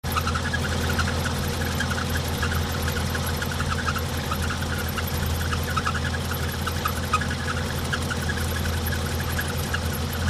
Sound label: Truck Idle Bad Steer